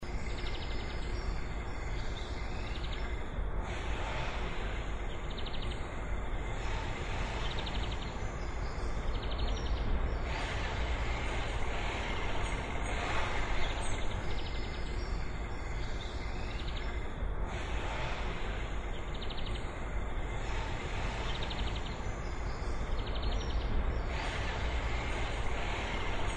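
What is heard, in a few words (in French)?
son pano extérieur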